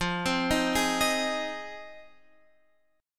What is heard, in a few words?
F Minor 7th F; arpeggiated